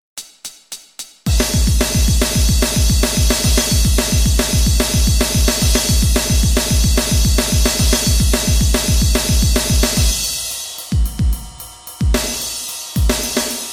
metal; fretjam

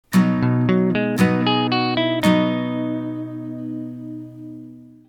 maj scale res; fretjam

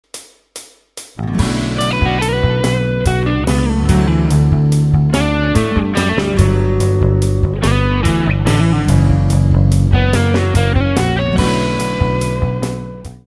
arpeggio

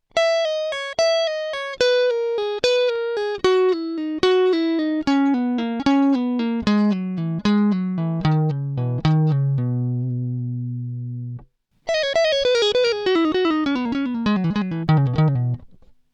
pull off; fretjam

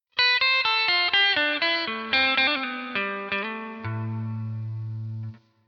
aeolian interval; fretjam

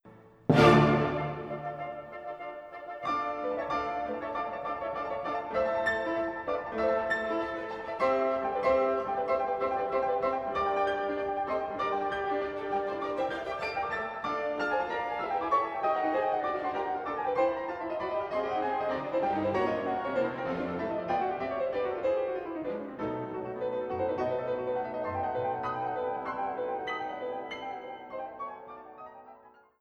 piano concerto; alla breve; rachmaninov

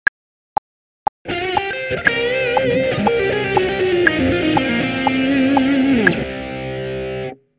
lick; fretjam

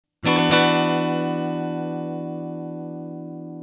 sus2 a; fretjam

